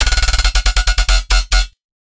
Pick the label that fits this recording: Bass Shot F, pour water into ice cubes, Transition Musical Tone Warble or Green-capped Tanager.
Bass Shot F